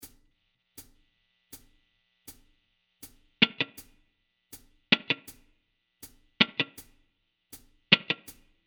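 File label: cutting f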